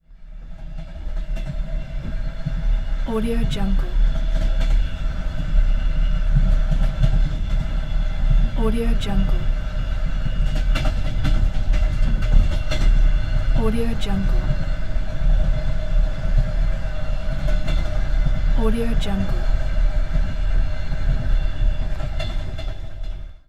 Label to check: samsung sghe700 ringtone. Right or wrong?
Wrong